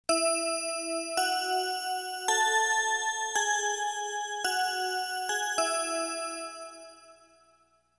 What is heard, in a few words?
bell